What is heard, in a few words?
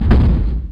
thud, mutant; lmctf